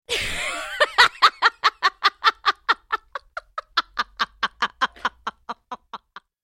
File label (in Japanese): 笑う女